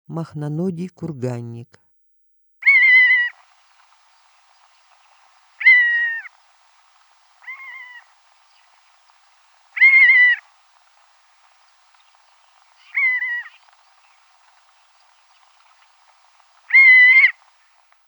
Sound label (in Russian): mohnonogiy kurgannik golos